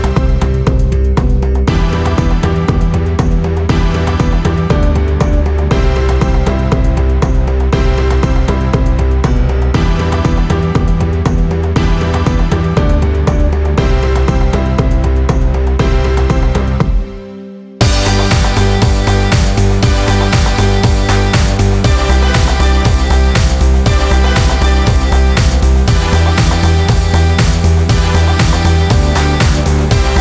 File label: Karaoke; theater